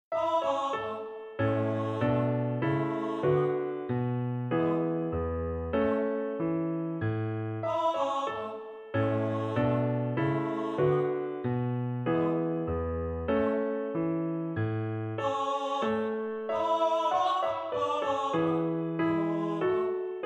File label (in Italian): Lied per voce e pianoforte; Meine Wunsche